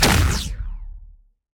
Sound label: tether explode; smite; osiris